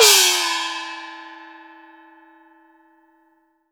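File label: LARGE GONG, CHINESE PERC; Spectrasonics - Heart of Asia CD2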